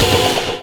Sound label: Synth Sound; Vengeance Samples; VEE Synth Shots